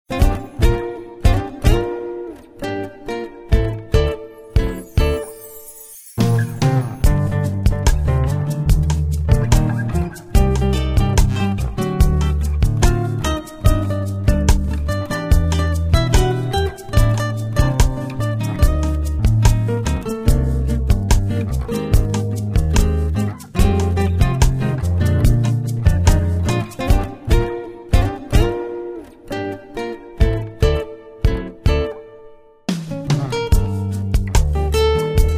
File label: Bossa